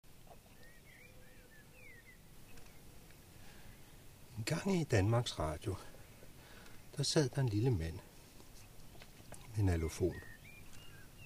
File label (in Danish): alufon